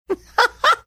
cute laugh

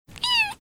meow

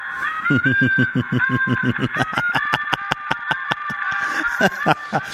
evil laugh

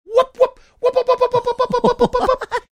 making whooping sounds

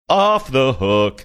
singing off the hook

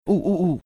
ooh ooh ooh

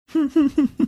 cute chuckles

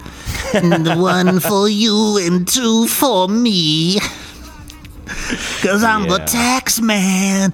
sings

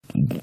frog like sound

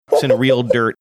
giggling

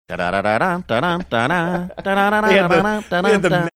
singing portion of

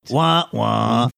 wah wah

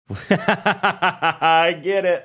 laughing saying i get it

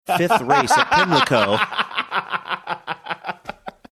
having good laugh

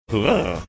making growling like sound

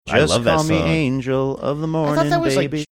singing just call me baby

